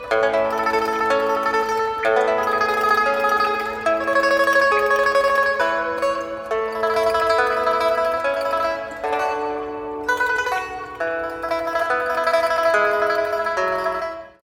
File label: pipa; StarEscort; qiyu